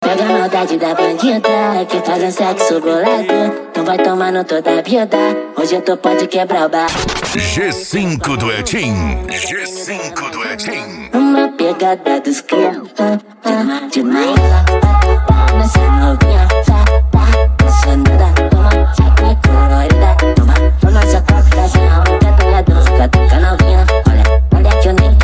mega funk e funk mega bass

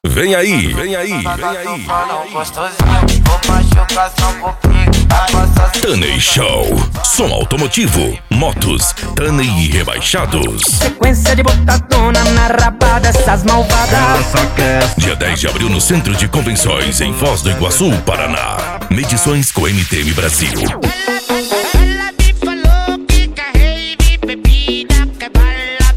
abertura; tuning show; foz do iguacu pr

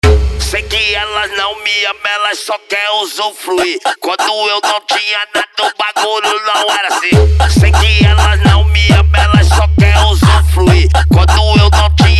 especial funk mega bass

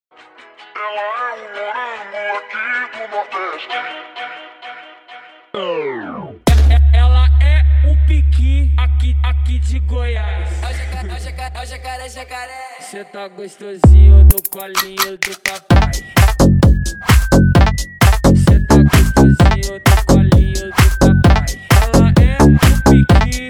mega funk

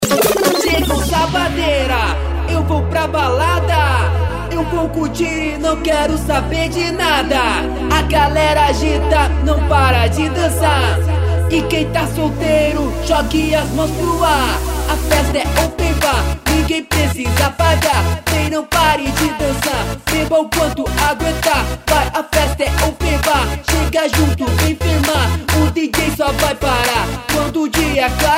eletro funk; cd balada eletro funk vol4

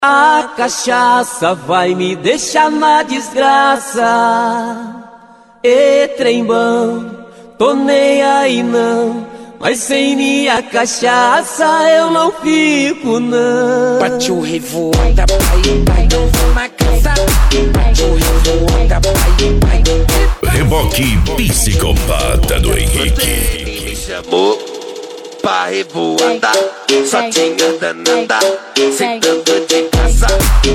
eletro funk

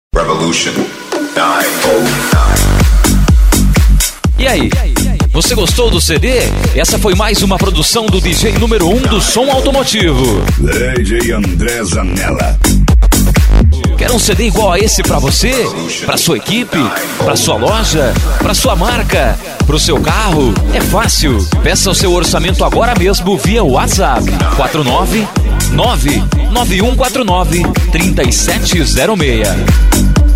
funknejo automotivo

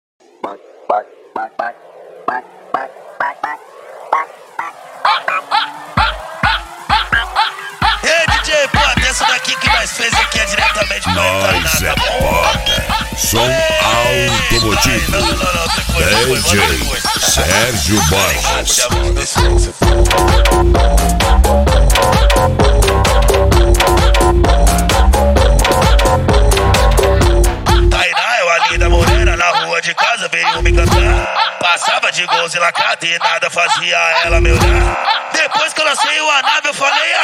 eletrofunk; som automotivo

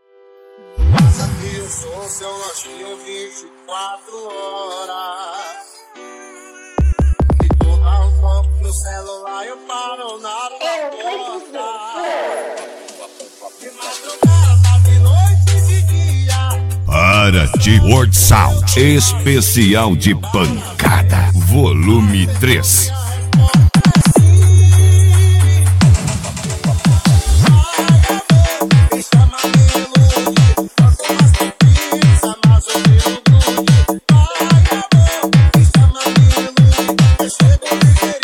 esp pancada

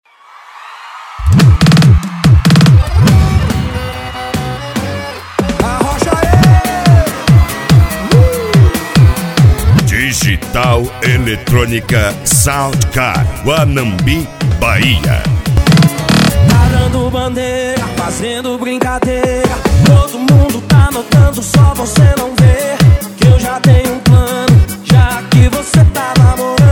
pancanejo eletronica sound car; cd pancanejo digital

